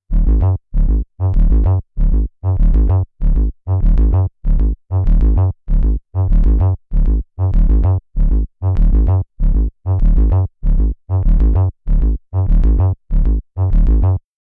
Moog DFAM Patch FM Bass

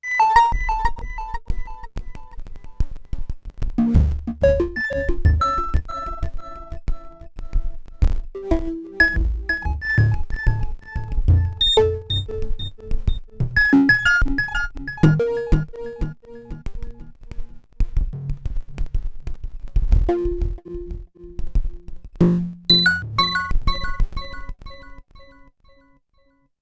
Anamono Xmini one Voice Delay; Gotharman

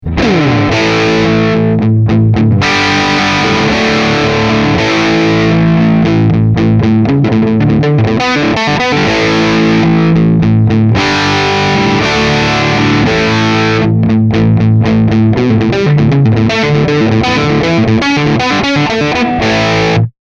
TT Overdrive

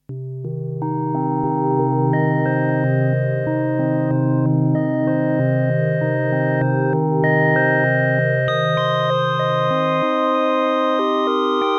Polysix Poly Hold

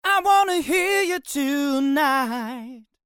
Vocal Original

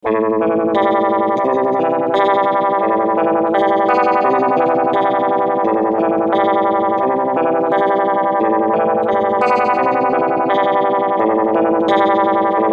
heavy vibrato

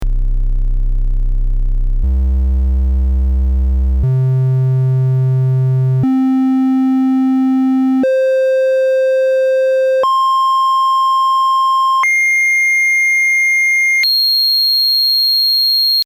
triangle

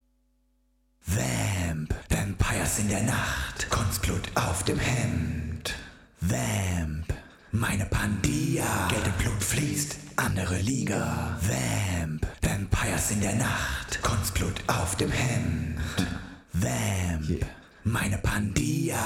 Gated; Tverb; VMPires; Heros Preset

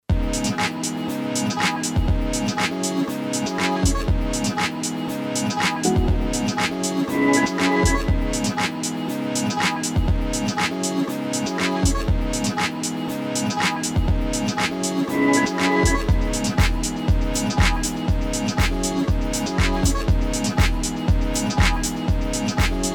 Novation Circuit Rhythm; RMR

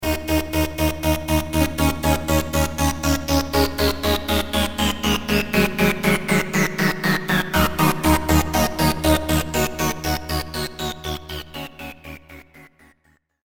pwm mod

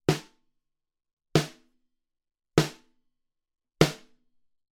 Portico 5024 NT3 snr silk snr

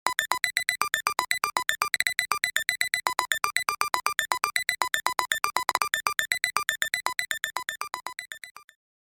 FM Hat VCOs; Kobol

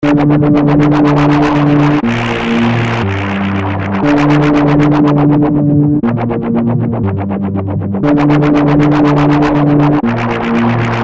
Mosaic Bass Filter It Down RHY Macro Modulation; Heavyocity

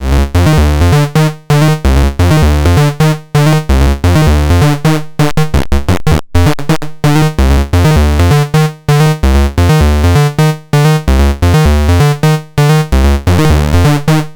Multi Function Discrete VCO PWM Modulation; ACL

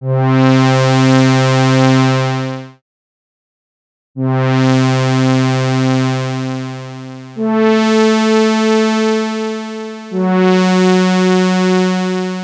OBX; Soniccharge Synplant 2; Crowning Glory Replikant

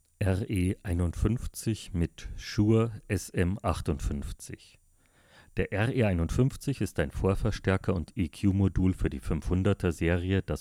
Lindell RE-51 Shure

